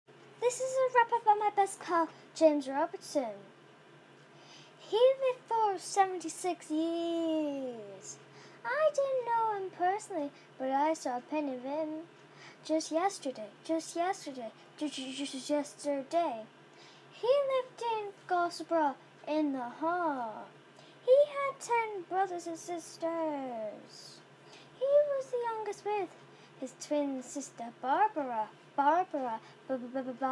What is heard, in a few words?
rap